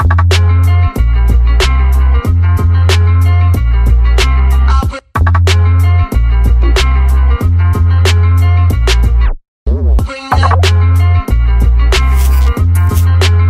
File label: Punjabi Latest Music Ringtone